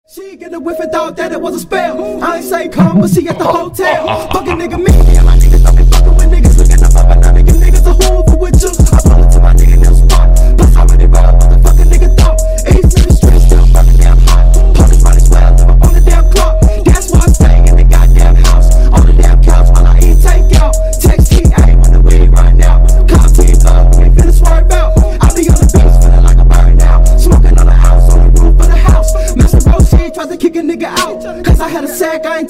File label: bass boosted remix